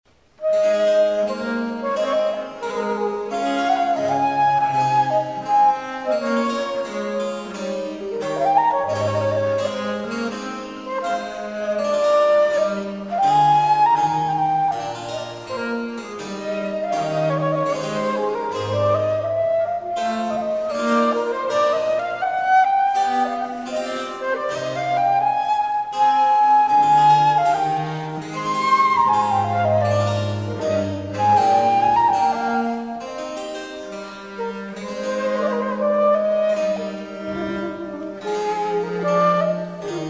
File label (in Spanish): sonata, flauta; locatelli